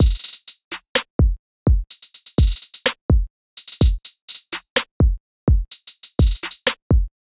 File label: loop trap 126 Bmaj